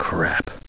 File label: hurt; gusanos promode